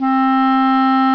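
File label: Clarinets; Instrmnt; Sound Library 2000; Wayzata Technology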